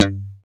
GTR Funky Strat, Funk & Mute; Club-50 - Foundations

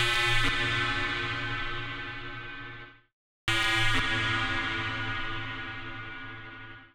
Juno Riff Bb-A, Grime, 138bpm; Zero-G Sound Sense Grime Scene; Construction Kits; Da Suspects

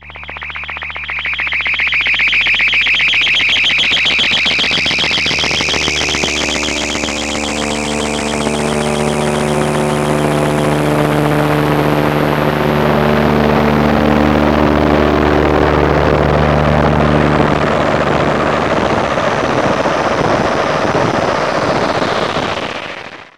RADIO PLA, ATMOS; AKAI Anime Sound FX

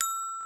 GLCKN

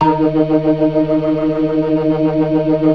ORGAN, 4 DRAWBARS; Keith Emerson Signature Series

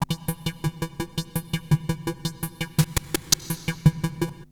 Korg Prophecy hits, arps and fx; FM 57 - The Ultimate Sample Collection (1997)

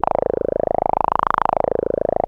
700 SYN C 1, Korg; Back In Time Records; Korg Universe VOL-1

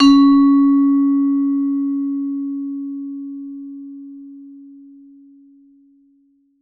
C#3, Vibes; Sampleheads - Dave Samuels Marimba & Vibes

